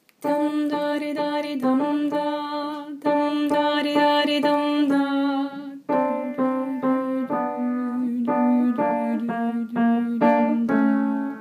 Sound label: Dalakopen SOPRAN